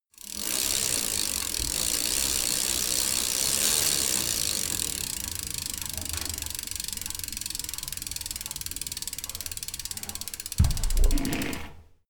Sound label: bicycle spinning wheel